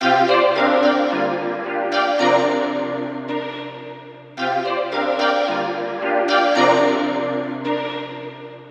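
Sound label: melody loop pluck C minor 110 BPM ambient R&B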